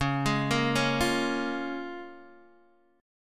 C sharp 6th C sharp down Guitar DADGAD

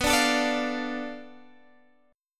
B Minor 7th Flat 5th B Guitar Standard; Bm7b5; strummed; x,14,12,14,15,13; JGuitar

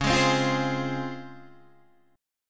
Db Major 7th Db down Guitar DADGAD

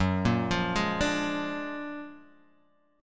F sharp Augmented Major 9th F sharp Guitar DADGAD

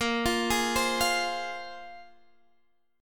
Bb 7th Suspended 2nd, Guitar, Standard; x,13,15,13,13,13; Bb7sus2 arpeggiated; JGuitar

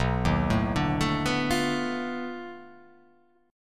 B 7th Sharp 9th Flat 5th, down

